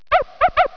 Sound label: DOG, 狗; 特酷声音素材库